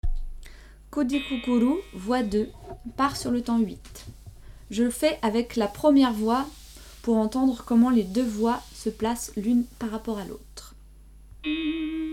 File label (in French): voix, chant d inspiration pygmées